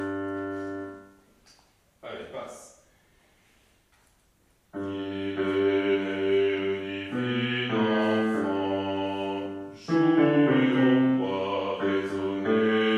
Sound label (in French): Il est né le divin enfant Basses